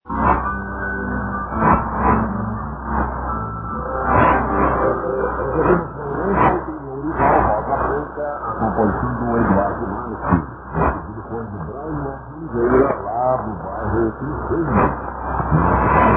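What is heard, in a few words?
radio, 9615 kHz; R Cultur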